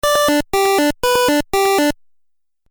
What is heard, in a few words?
Musical Phrase Video Game